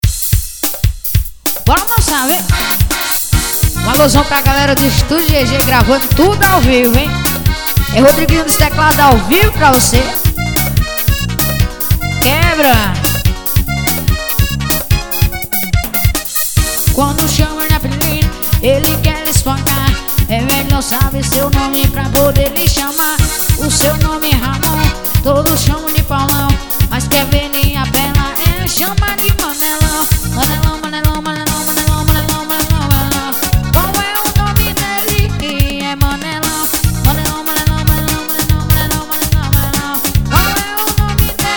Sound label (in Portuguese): forro promocional